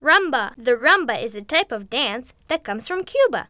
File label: Rhumba; The Interactive Alphabet